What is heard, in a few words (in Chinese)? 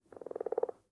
青蛙待机声